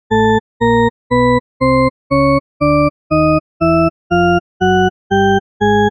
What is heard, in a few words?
Shepard Tone A